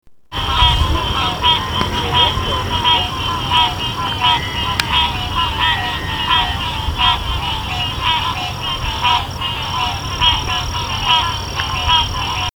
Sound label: Green Tree Frogs; Berlin Worcester MD